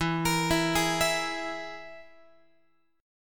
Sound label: E Major Flat 5th, Guitar; EMb5 arpeggiated